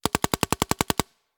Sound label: Paintball Gun; Sound Ideas; Guns